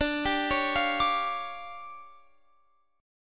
D Minor Major 7th Double Flat 5th, D, Guitar Open F; DmM7bb5; arpeggiated